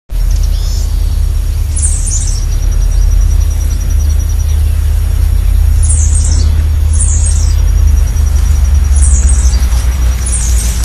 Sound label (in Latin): Tiaris obscurus; Parana Entre Rios; Jorge La Grotteria